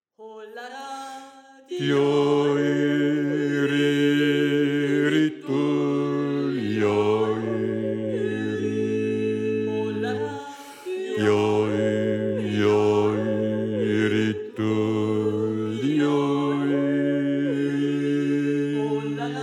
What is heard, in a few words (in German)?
Bass